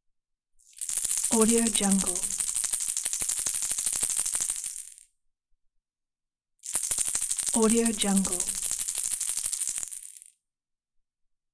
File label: rattle